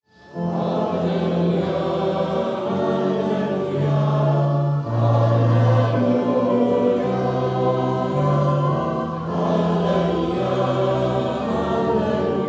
Church Hallelujah

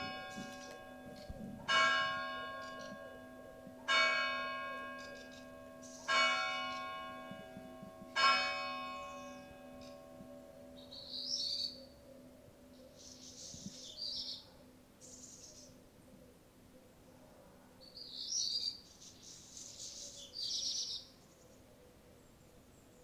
Morning Bell

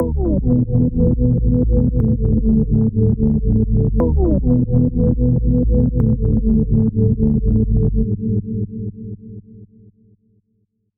Synth Seq Melodic Sequence Pad Stuff 120 Bpm

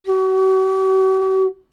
Flute G

